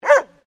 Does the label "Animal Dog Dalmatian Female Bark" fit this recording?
Yes